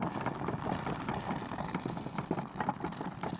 reel spinning; Champions of Poseidon

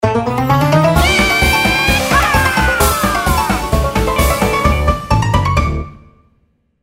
mega Win; Dynamite Digger Eureka

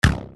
Reel Stopping; Dynamite Digger Eureka; original dynamite digger audio

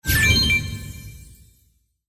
payline Seven; Crystal Furnace

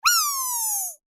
voice laugh; Fruity Burst 2

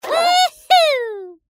Spin Press; Bouncy Balls 2

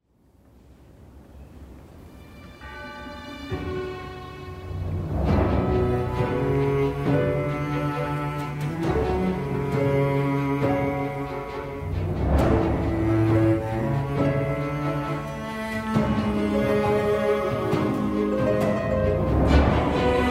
bg music; Champions of Poseidon

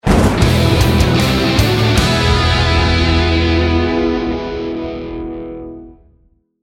large Win; Champions of Valhalla

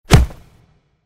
kick; Football! LuckyTap; Eyecon

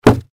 bonus caber land; Highland Reels

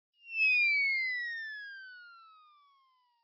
Icon Falling; Bouncy Balls 2

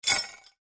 bonus hammer throw; Highland Reels

